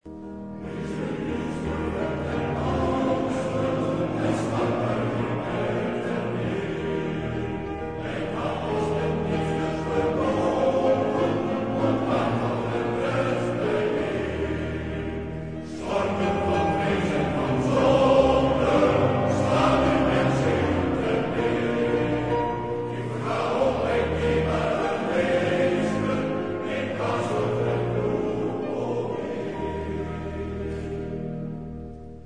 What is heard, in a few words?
stormwind